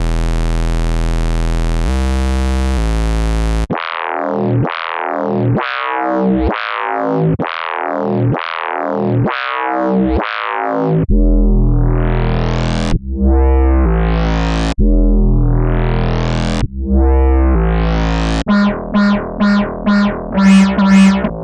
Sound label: Square Wave; Turbo